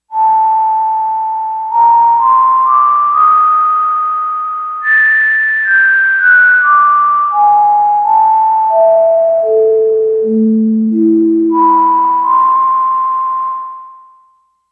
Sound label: Wind Voice, Yamaha DX11; Factory Preset Voices